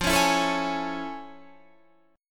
A Major 7th F; AM7/F; strummed

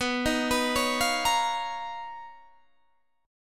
B Major Sharp 11th; arpeggiated

